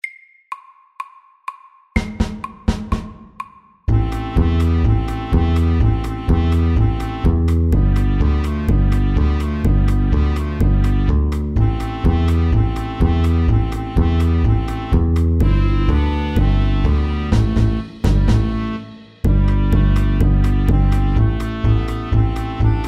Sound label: Balkan stomp